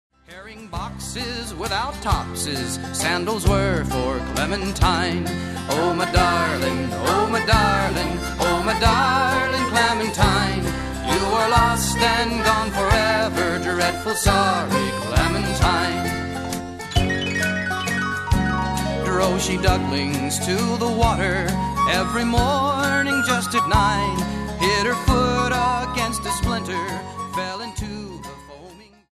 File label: karaoke vocal clementine; disney; childrens favorite songs